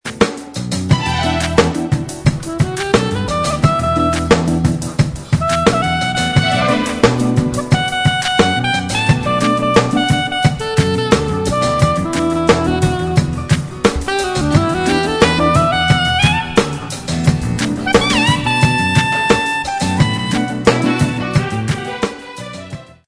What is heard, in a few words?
LOOP; Suite Coll. Vol.9